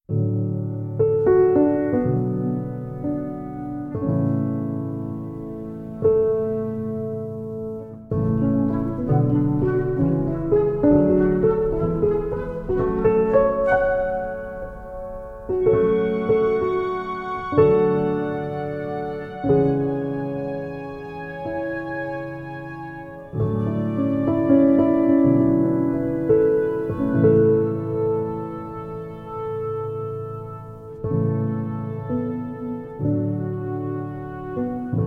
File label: Piano, Cinematic